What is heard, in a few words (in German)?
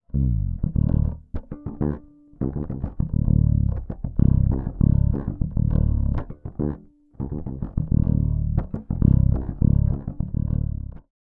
Bass Kuma Fretless 5 Groove One Humbucker Tonblende offen